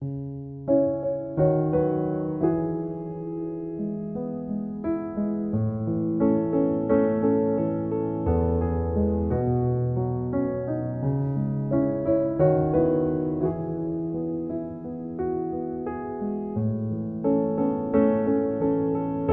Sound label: Piano